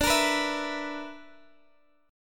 B Minor Major 7th Double Flat 5th, chord

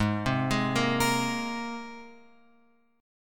G sharp Major Add 9th, chord; G#add9 Chord {4 3 6 3 X 6} arpeggiated

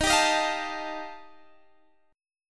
G 7th Suspended 2nd Sharp 5th

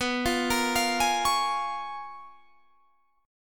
Bb Minor 7th B; Bbm7/B arpeggiated

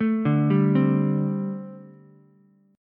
D 7th Suspended 4th, down, Ukulele; arpeggiated; JGuitar

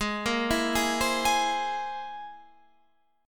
C Augmented 7th Ab

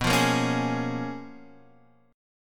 Bb Minor 7th B; Bbm7/B strummed

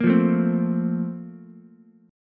A sharp Major 7th Suspended 2nd, Ukulele; A#M7sus2; strummed; JGuitar

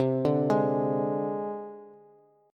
C Suspended 2nd Sharp 5th, down, Bass; Csus2#5 arpeggiated; JGuitar

